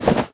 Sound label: bomb holster